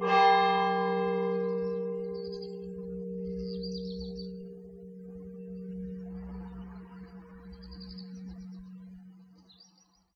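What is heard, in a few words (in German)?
Schlag, Glocken; Christus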